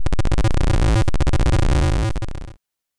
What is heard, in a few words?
rocketing; Rocket-Bytes